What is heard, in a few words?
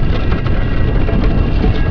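wood slide; Hexen2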